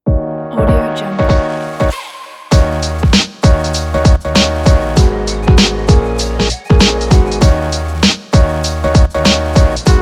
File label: hip hop